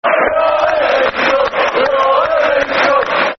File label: Football Chant (Soccer Song), Man United; We All Hate Leeds Scum